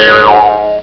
Wrong

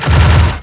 FLING

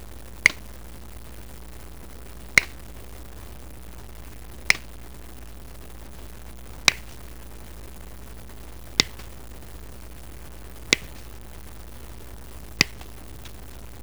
SNIP

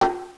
kling

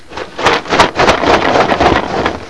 CEREAL

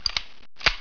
RELOAD

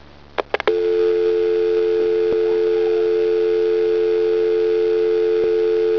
DIAL TONE

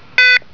TUUET

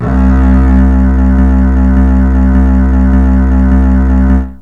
CELLO

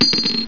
SHELL; BUCKYBLS; QUAKEC

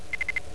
TURB BEEP

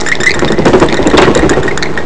Milling Wall; MarkoSuominen; GraveYard

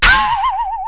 SYRINGE; JOHNNY

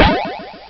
echo gun; alienfrenzy

